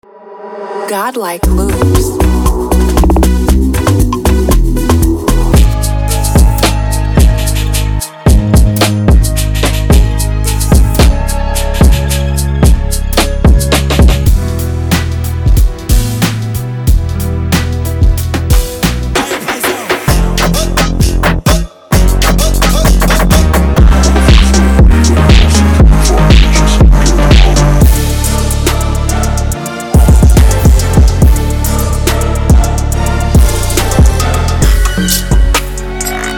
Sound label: drums